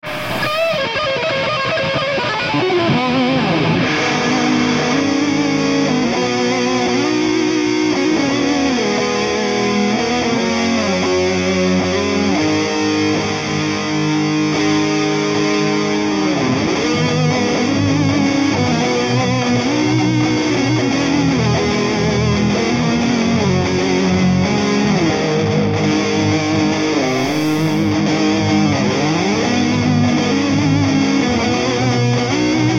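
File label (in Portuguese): riff guitar base tonica terca oitava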